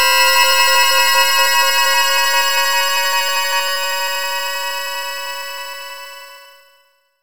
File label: Retro Weird Psych Long